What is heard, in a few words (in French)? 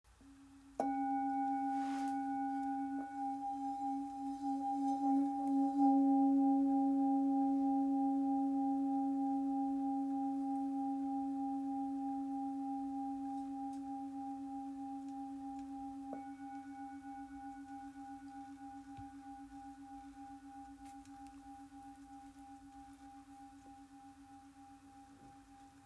Bol full moon do diese